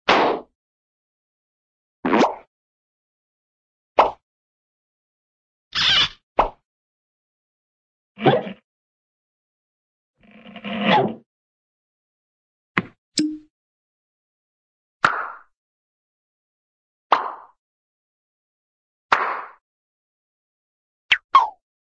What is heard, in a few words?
pop, cartoon paper bag burst